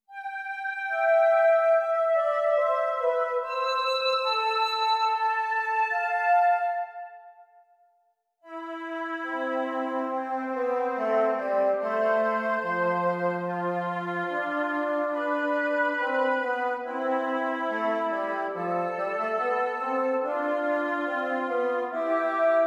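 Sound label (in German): Doppelter Kontrapunkt, Duodecima acuta e Quinta acuta; Seyfied pag. 249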